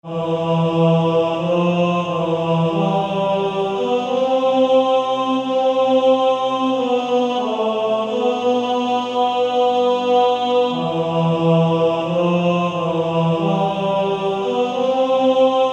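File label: Male Choir, tenor 1; TTBB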